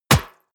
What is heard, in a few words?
hollow short medium, hits impacts; whooshes rises